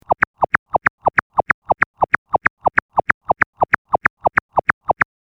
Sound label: anime walk